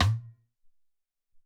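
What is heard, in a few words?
Hop Stick, Alesis SR-18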